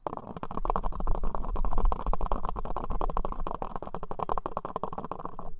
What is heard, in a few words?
Hungry stomach